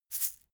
shaker plastic egg hit double soft

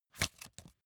magazine flip back fast

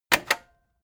cassette eject click fast heavy

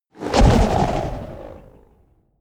whoosh flutter

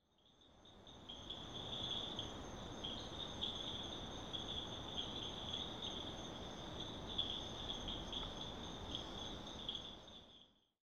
insects jungle atmo

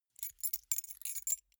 key ring small rattle

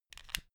stick lighter extinguish flame

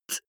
beatbox hi-hat damped

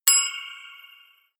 notification bell

